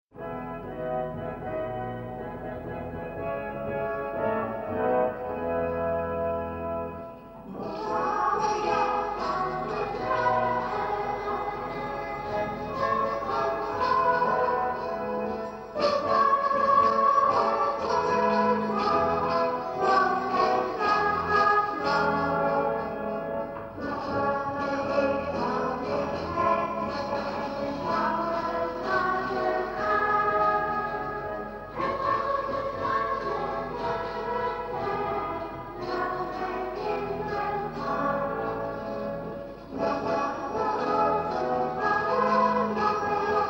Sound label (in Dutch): Doortocht door de Riet zee zonder Farao, klaszang